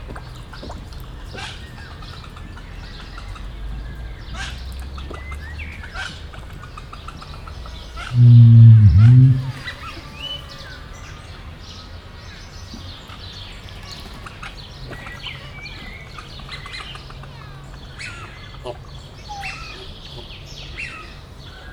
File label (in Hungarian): egyet, nandu; kecskemeti zoo 2016